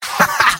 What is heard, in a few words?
scout laugh short; vo; tf2; mvm